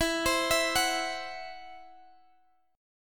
E Suspended 2nd Sharp 5th E, Guitar, Half Step Down